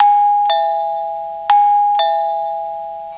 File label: chime